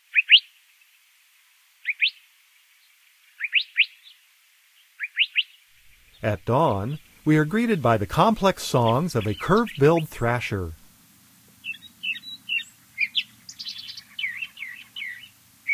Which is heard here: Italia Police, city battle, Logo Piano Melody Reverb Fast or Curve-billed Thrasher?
Curve-billed Thrasher